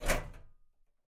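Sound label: Server battery Open; miside demo windows gamerip 2023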